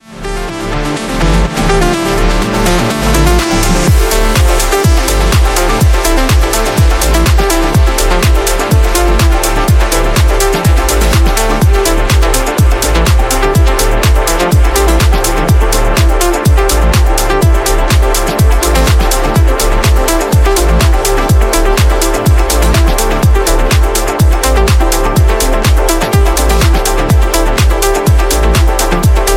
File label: Roller Groover, house